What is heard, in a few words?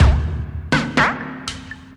Loop